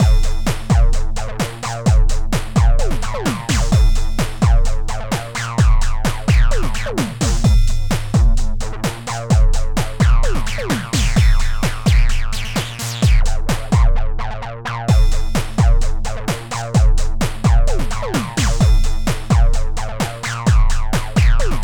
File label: smackbox acid; smackbox v2; dropshot audio